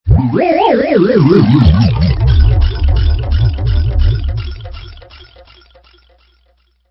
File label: Stinger; STINGERS